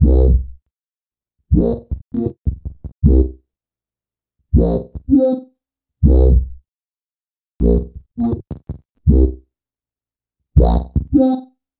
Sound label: Acid Bass